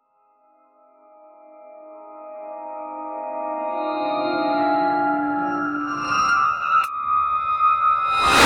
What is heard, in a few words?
surreal horror music; dissonant